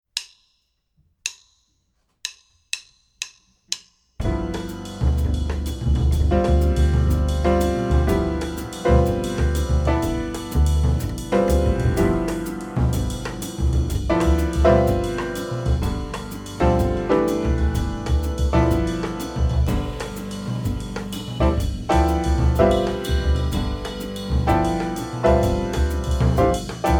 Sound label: Backing Track Mixolydian Mode